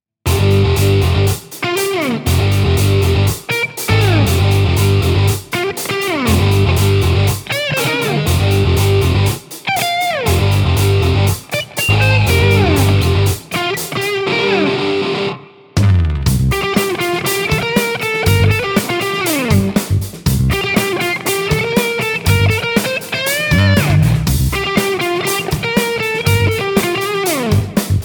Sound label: guitar